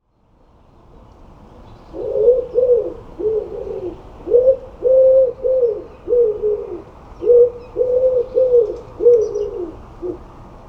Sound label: Keršulis - Common Wood Pigeon - Columba palumbus